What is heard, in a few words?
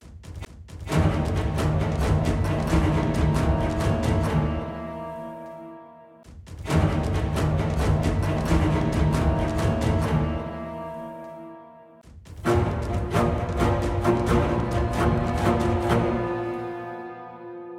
BGM combat mob enemy, Player dying; Babylon's Fall